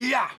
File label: Attack Medium